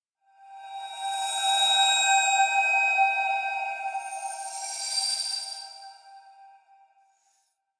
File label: Lens flare transition